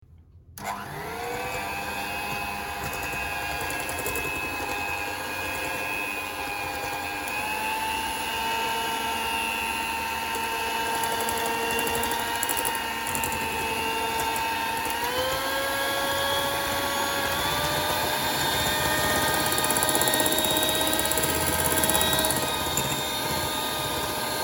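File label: kuhonnyi mikser, kitchen mixer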